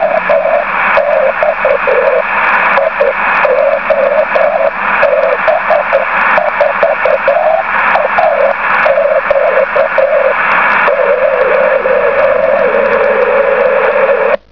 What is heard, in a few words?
rain